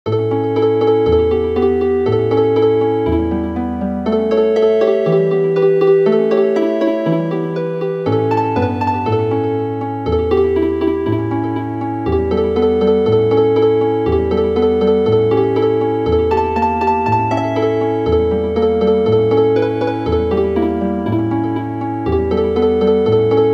Harp

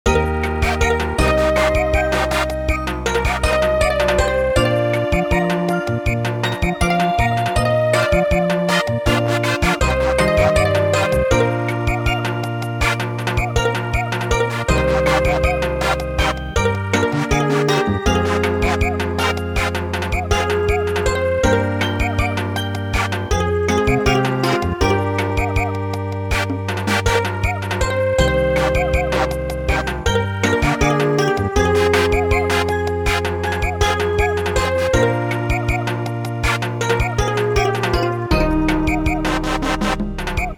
Samba, traditional

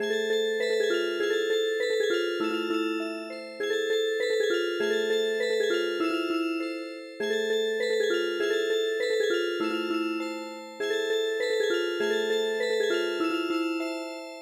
Music Box, traditional; Qashuqai

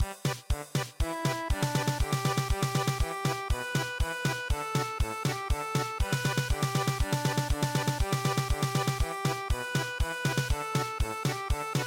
German Polka; midi; traditional

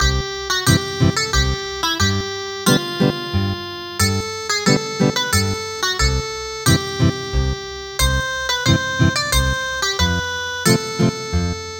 Ethnic Guitar; There goes last year

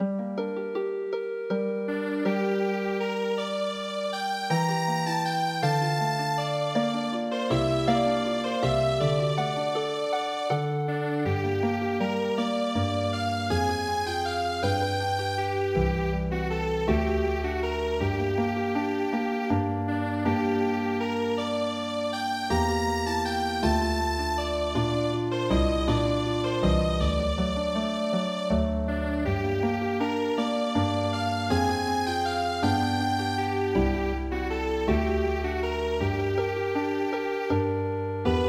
Sicilienne Healing Harp

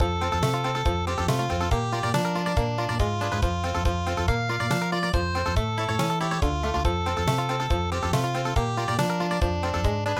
Country

